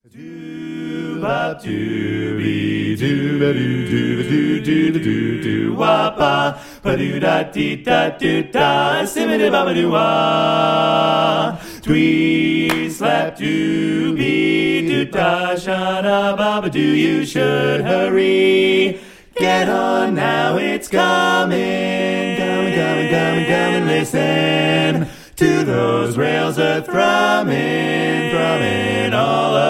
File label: Barbershop Quartet